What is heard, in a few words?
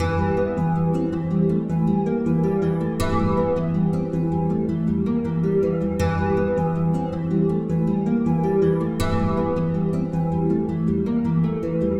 E 160 bpm; samurai